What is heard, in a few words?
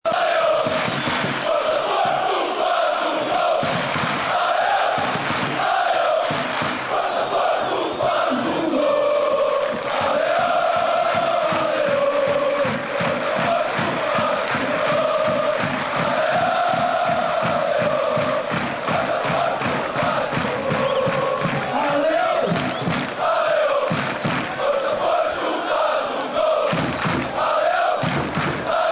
Allez Oh, Chants